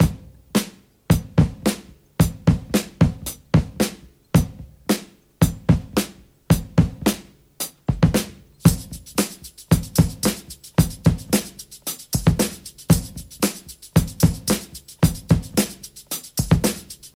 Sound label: 112 bpm drum groove e key, Free drum loop sample - kick tuned to the E note. Loudest frequency: 2518Hz